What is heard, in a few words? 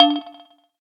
electric, keytone